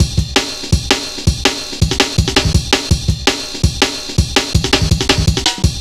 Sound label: 165, Drum Loops, Drum & Bass; Total Drum&Bass